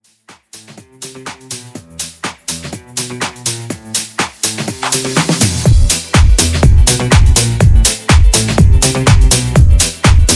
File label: Afro House Original Mix; Remixfreakz